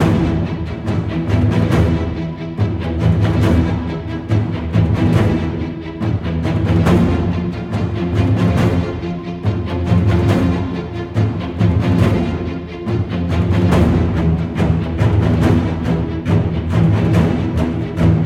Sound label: rising water music; Shu; ps vita ps4 switch windows